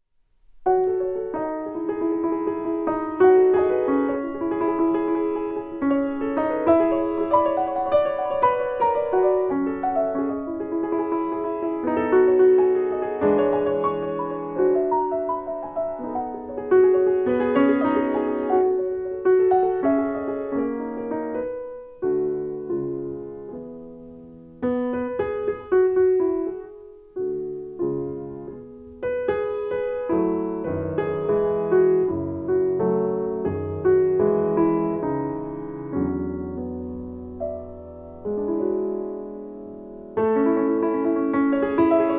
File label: piano puzzler